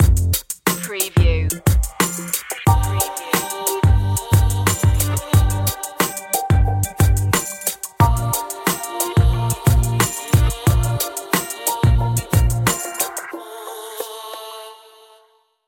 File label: electro hip hop; 15secs